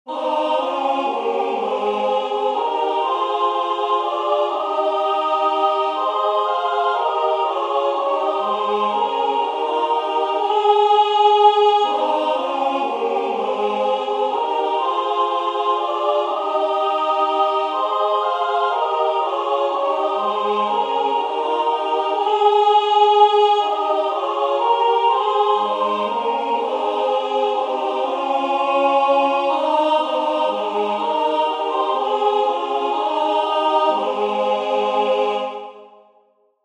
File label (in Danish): 3 lige stemmer; dds 291 du som går ud